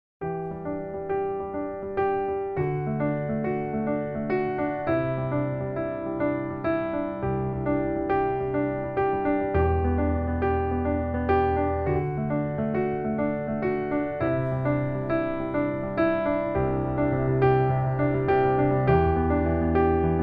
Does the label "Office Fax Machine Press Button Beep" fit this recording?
No